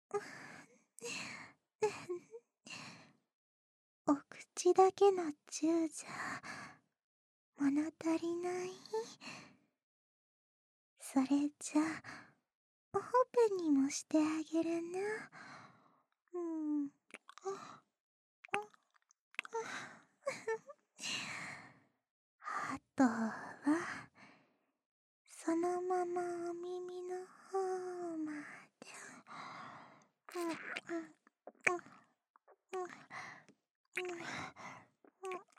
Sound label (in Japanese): 耳舐め; 耳舐めlong